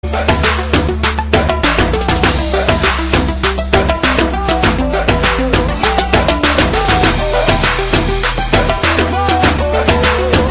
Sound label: Trap Mix